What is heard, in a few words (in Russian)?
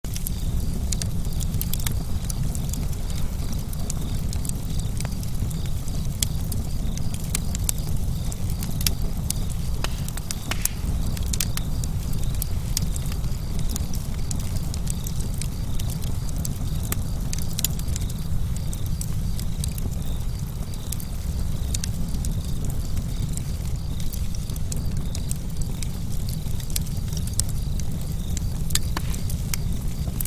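sverchok zvuk, cricket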